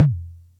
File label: Percussion Computer Tom; Atlantex MPC-1; Atlantex Music Percussion Computer